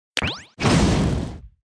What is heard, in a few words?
attack; hero